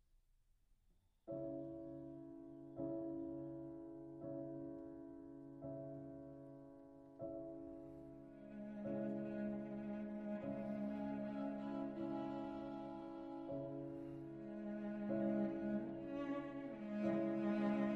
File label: Piano Trio In E Minor Poco Adagio; Dvorak; Op 90; Dumky 2